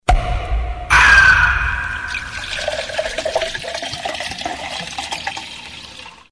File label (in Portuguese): efeito cerveja